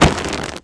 umbrella hit; bouncyobjects; Tony Hawk's Project 8; XBOX

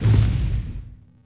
fs bantha l, creatures; Star Wars Obi-Wan; XBOX

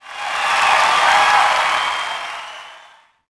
POS Reaction,Crowd; Cars; XBOX

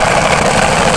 grind conc; terrains; Tony Hawk's Project 8; XBOX

